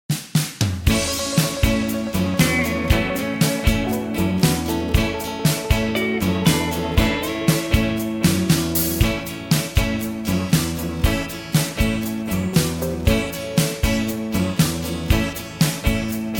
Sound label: A No Backing Vocals, backing tracks